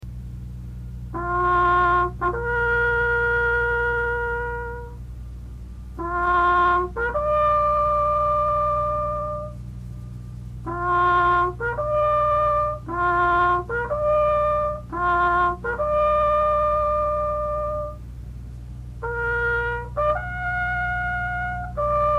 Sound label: Taps, bugle calls